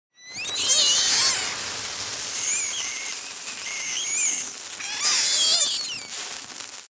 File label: asylum altar burn rat